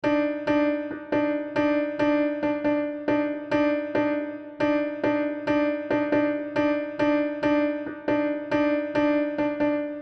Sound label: Telephone